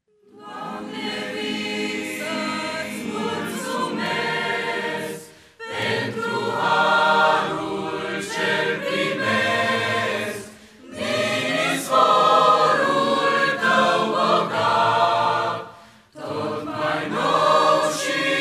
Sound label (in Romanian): cantare; En-Hakkore